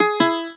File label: guitar